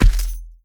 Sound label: Footsteps Dirt Run; The Town